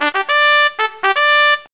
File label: trumpet; Wanted